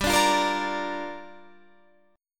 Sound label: Bb 6th G